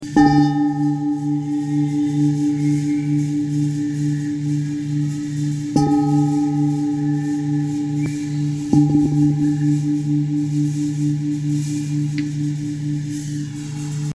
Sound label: 12 inch sound bowl